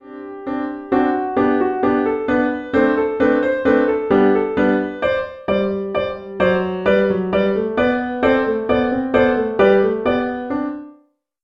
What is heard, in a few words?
elementary piano solo